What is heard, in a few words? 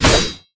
weapon sword slash